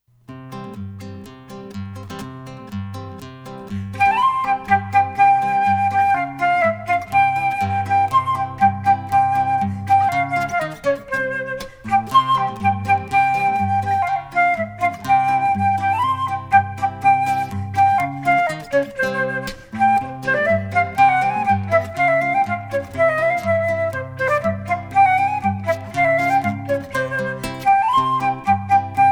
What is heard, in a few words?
adagio